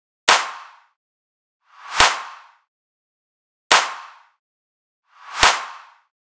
Riddim Drum Loop 140 Clap; Ghosthack; Riddim Essentials Volume 2; Full Drum Loops

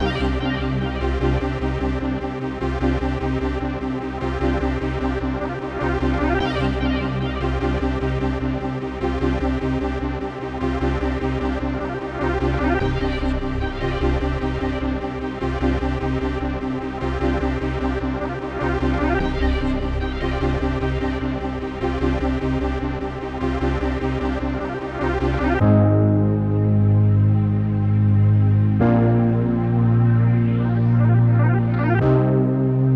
150 BPM C Min, Melodies; Trigger Finger; Cymatics; Destiny Premium Melodies (BETA)

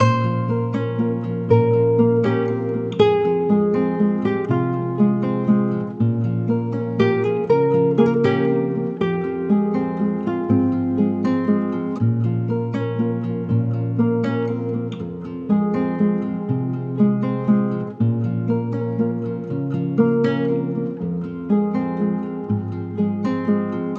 160 BPM F Min, Guitar Loops; Centenario; Cymatics; Journey Guitar Loops

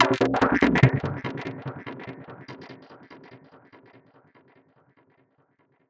Gated Chrystal Stab B 145 BPM

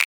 Snap; Drum One Shots

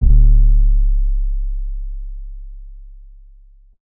Impact; Hits

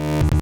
Grid Fragment F# 142 BPM, Psytrance; Grid Loops; Fanatic

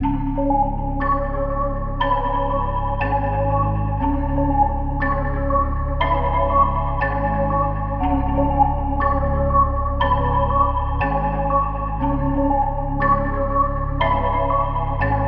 120 BPM C# Min; Cymatics Gems Vol 4; Death Race; Gems Free Teaser Pack

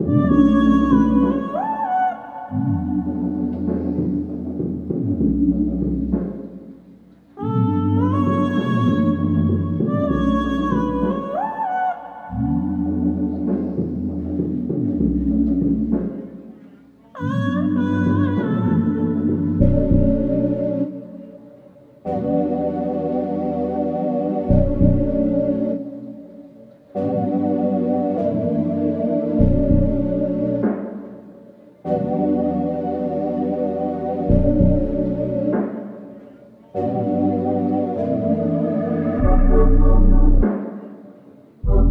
98 BPM B Min, Melodies RnB; Cymatics; ZODIAC Beta Pack

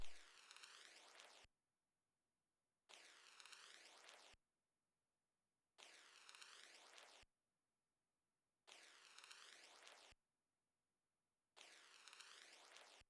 166 BPM F# Min Texture; Trap; Melodies; Loop Stems; Cymatics; Trap Starter Pack; Toronto

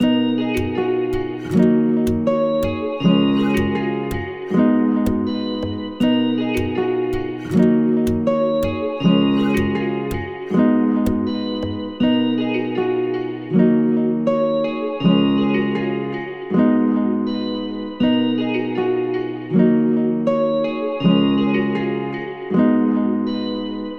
160 BPM D# Min Guitar; Beautiful

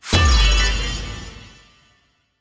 Hero Death FX - G6(9), Video Game; Cymatics; Video Game Sample Pack Vol 1

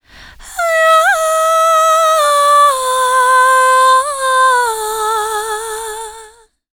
Vocals Am 65Bpm; Construction Kits; Ghosthack; SkyRealm; Kit 22 - Solace in Falkreath

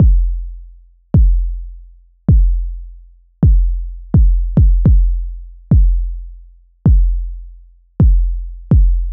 Machine Drum Loop 105 BPM Kick; Experimental Drum Loops; Loop Stems; Cymatics; Mystery Pack Vol 4

